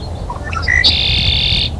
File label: redwing; natureNavigator